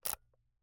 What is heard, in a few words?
Camera, Kinusa K77, 50mm lens, plastic, serrated, lens adjust; Eiravaein Works; Vaeyan IV